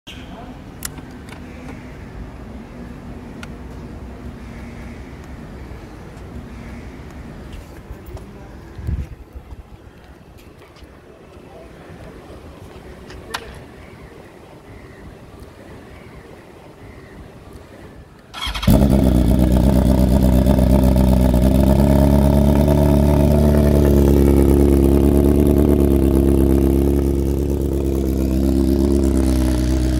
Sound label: LOUD BMW S1000RR Akrapovic GP Exhaust System Sound FLAME SPITTER